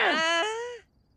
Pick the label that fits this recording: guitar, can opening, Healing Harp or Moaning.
Moaning